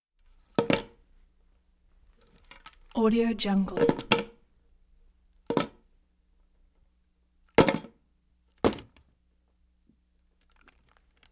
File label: headphones